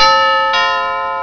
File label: DINGDANG, door bell